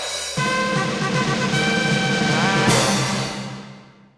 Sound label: The Cavalry, Fanfare; 1997 MMX LAN PACK (win 95); Worms Armageddon